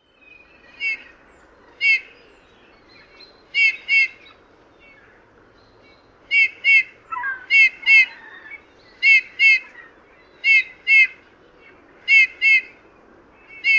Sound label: Pyrrhula pyrrhula calls; Kullen